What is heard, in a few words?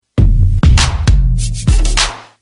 msg tone; alternative